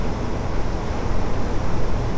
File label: vent